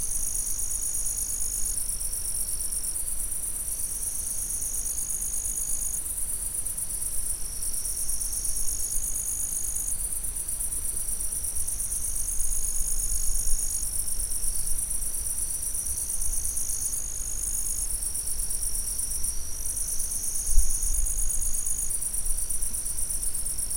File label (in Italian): notte cicale; Soundscapes